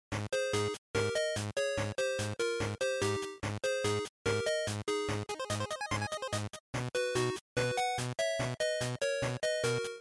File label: GB Fighting Result